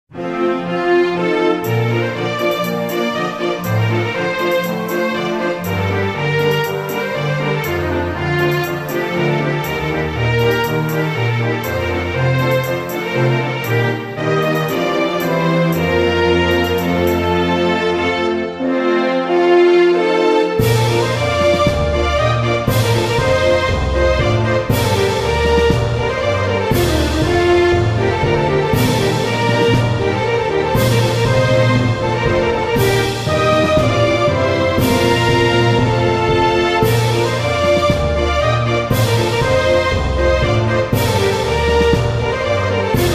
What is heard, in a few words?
bgm orchestra; maou